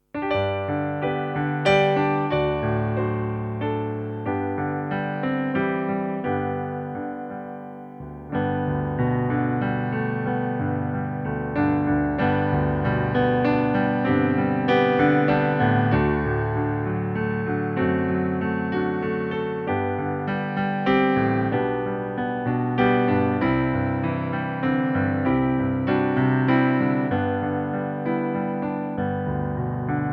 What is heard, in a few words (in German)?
Stille Nacht G Dur 3 Strophen, pianoplayback; traditionell